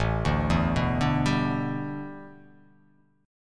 A 7th Suspended 2nd Suspended 4th A; chord

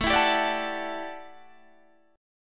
A 13th Suspended 4th A, guitar chord

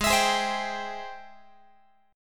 Ab Augmented Major 7th, Guitar; Ab+M7 strummed; 4A,4E,5A,5D,5G#,6C#

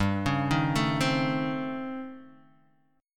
A 7th Sharp 9th Flat 5th

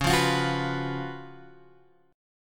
C sharp 11th C sharp; C#11 Chord {9 8 X 10 7 7} strummed

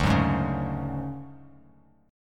D Major 7th Flat 5th D down Bass Standard, chord; strummed; JGuitar

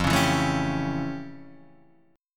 F Augmented Major 9th, Guitar, Half Step Down

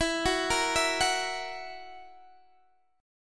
E Suspended 2nd Flat 5th E down Guitar Open D

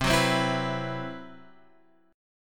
C Major 7th Flat 5th, Guitar Open F, chord; strummed; CM7b5; x,7,6,7,7,6

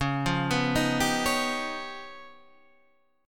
C sharp 9th Flat 5th C sharp Guitar Drop D; down; chord shape

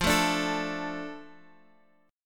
F 6th, Guitar, Open F; 17,16,14,16,x,x; strummed

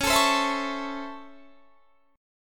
Db Minor Major 7th Sharp 5th Db, chord; strummed

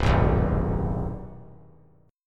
C Minor Major 7th Sharp 5th chord; CmM7#5 strummed; JGuitar

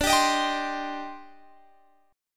C Minor D, Cm/D chord; Cm/D strummed

